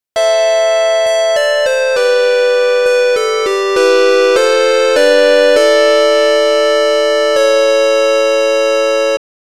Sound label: you lose; Meikuru Welcome to Happy Maid Life